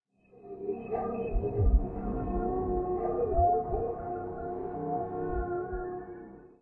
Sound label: entrance, undeadtower